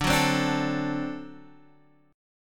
D 7th, down, Guitar, Standard; D7 strummed; JGuitar

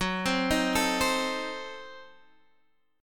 F sharp Diminished 7th F sharp Guitar Standard; arpeggiated; 14,15,13,14,13,x

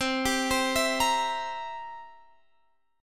B sharp 7th B sharp; B#7; arpeggiated

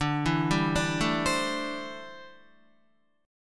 D 7th Suspended 2nd Suspended 4th D, Guitar; D7sus2sus4; arpeggiated

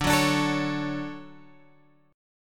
D Major 7th, Guitar, Open G

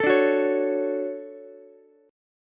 D-Major 7th, D, Ukulele; strummed; JGuitar

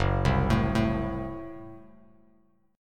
Gb Diminished, Bass Standard; arpeggiated; JGuitar

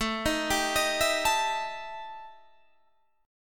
E Minor Major 7th Double Flat 5th

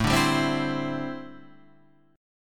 D 7th Suspended 2nd Suspended 4th A

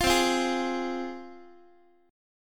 C-Suspended 4th Sharp 5th C down Guitar; Csus4#5 strummed; x,x,8,10,9,7; JGuitar

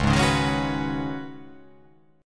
C sharp 9th Suspended 4th C sharp down, chord

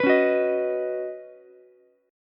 Db 9th Suspended 4th Db down Ukulele C6; strummed; JGuitar

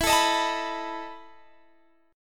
E Major 7th Suspended 4th E down Guitar Standard; EM7sus4; strummed; capo=2

